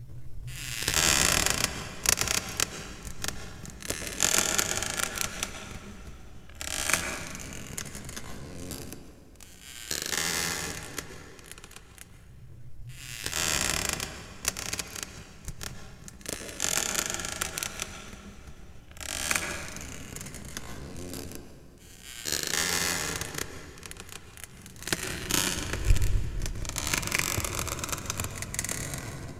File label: Ghost ship